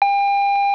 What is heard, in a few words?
tone